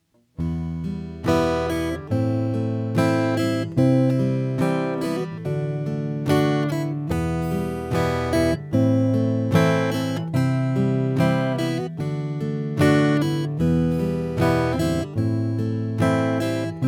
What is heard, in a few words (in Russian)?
Boj s pereborom